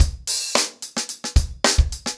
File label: 110BPM, acoustic drums; The Roots Drumloops